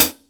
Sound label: HH CL, Drum 1 Dry; East Collexion